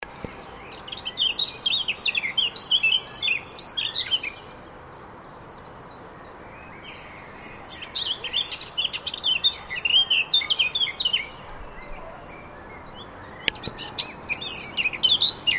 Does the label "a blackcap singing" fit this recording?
Yes